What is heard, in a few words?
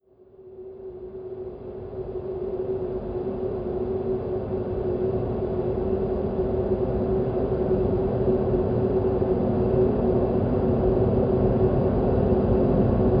scary wind